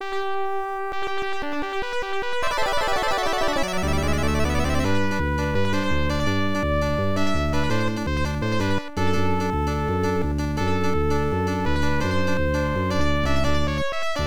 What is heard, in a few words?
Game Set (Won); Pro Yakyuu Family Stadium Pennant Race; MSX2; MSX-Music